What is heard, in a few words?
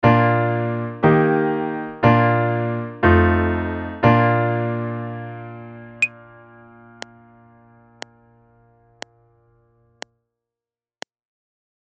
Bb Major 68 5 Measures wo Piano